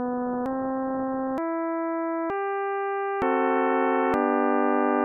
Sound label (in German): akkorde